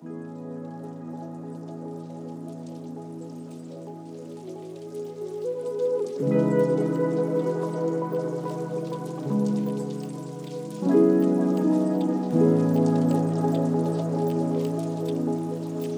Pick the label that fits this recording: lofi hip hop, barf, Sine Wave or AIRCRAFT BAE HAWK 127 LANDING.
lofi hip hop